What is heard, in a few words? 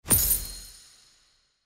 Reward Claim monthly